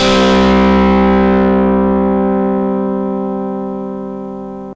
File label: steel guitar medium distorted; instruments; lmms